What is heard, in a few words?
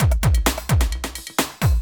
electro kit 130